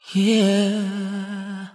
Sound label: yeah SUNG